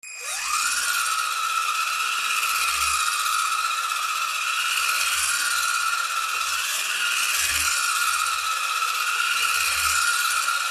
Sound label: Noisy